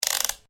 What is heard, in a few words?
Clockwork Windup, Slow Winding Of Small Clockwork Motor; Wind Up Toys; Stuart Duffield